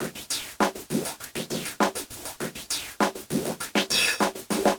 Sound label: Ghost Loop 100BPM, drum loop